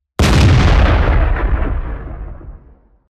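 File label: sound of explosion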